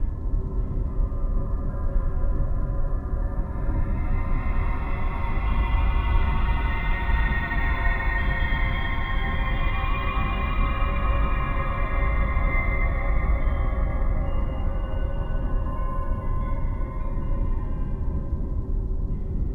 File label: Magic, ambience